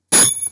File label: Hilt Clang